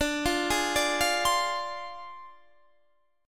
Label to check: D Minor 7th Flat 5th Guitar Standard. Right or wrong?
Right